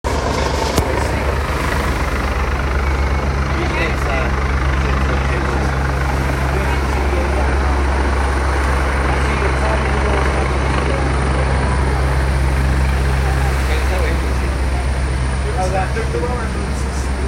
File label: Helicopter